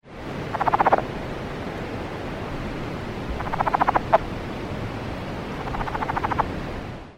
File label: Cascades Frog - Rana cascadae